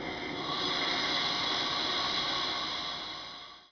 Air High, Train; H-START Stadler KISS

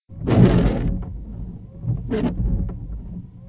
Dry Wipe; OpenBVE

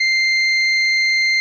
Adjust; R220 MUE5 - 6 Car (Alstom) 2019-Present

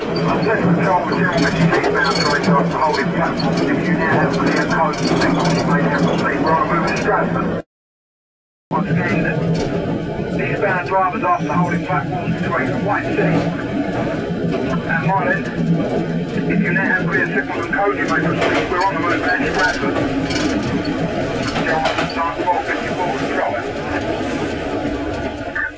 radio, Central Line; OpenBVE

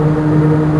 Motor, Train; F40PH-2 x3 13 Car Superliner Long Distance Pull

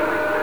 Motor, Train; OpenBVE

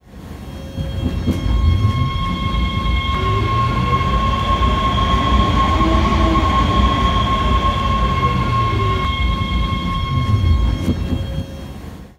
Motor, train; OpenBVE

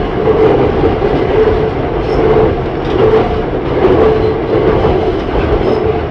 flange, Train; OpenBVE

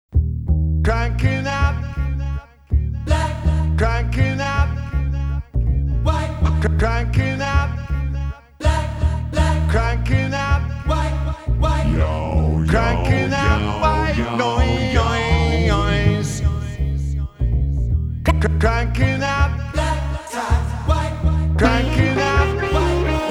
Here Come Da Jazz

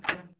switch, train; Z8100; OpenBVE

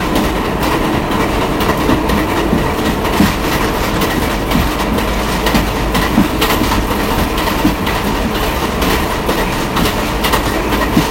RUN, Train, R62 Kawasaki; OpenBVE

